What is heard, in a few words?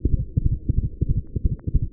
Cat Gallop